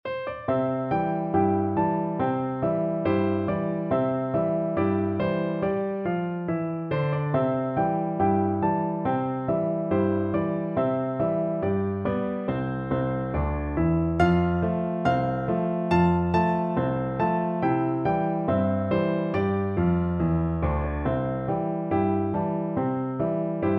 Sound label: alabama, piano duet